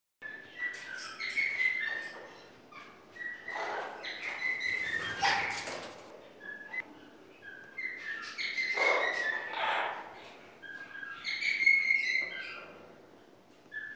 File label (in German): balzender Nymphensittich